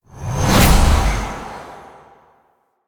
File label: Wellspring Cast; Warframe; Focus; Zenurik